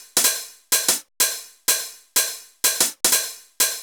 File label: drum loop ninety pop hat 125, Classic Chicago House; Sample Magic; Drum Loops